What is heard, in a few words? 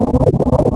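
eng fx loop, ships engine; Quantum Redshift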